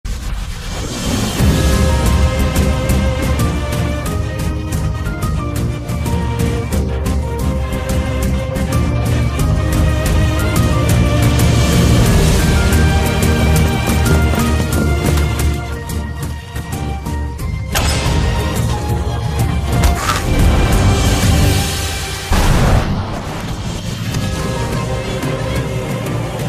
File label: trailer bgm trending bgm ringtone tamil ringtone; star trailer; kavin; elan; lal